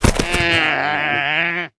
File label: Ram Mount Death Underlay